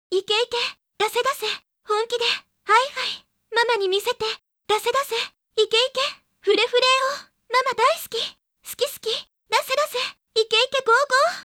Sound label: cheer loop